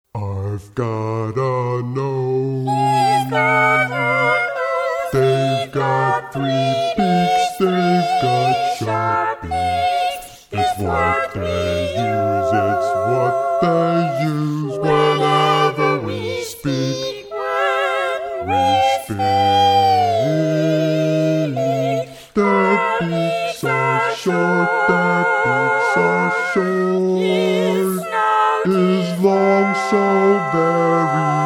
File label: Barber Shop Quartet